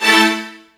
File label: hit, String; Notes